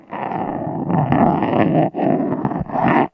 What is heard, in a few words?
Wood Floor, Marbles, contact mic; musicradar; contact mic madness; Raw